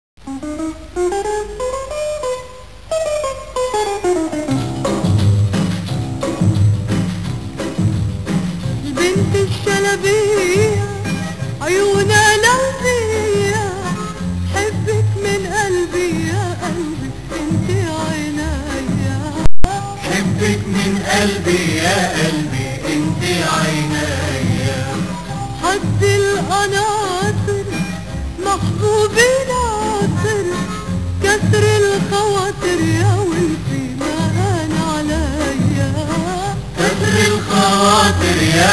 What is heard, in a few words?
egypt musik, ambience